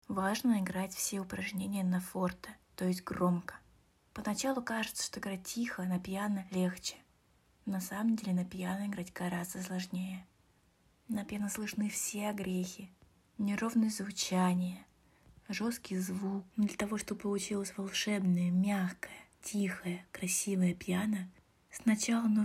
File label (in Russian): Играйте на F